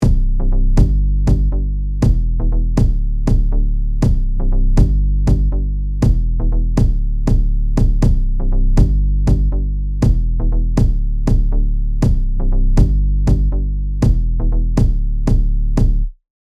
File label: kick bass inverted eq; cla mixhub; waves